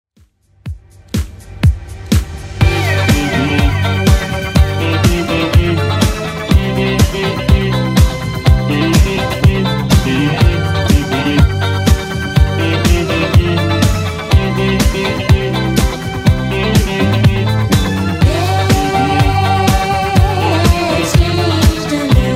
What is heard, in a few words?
80s Redrum 123 bpm CLEAN